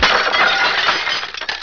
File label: HEAP, robots